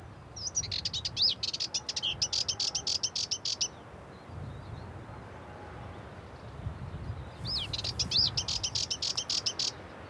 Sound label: Sylvia melanocephala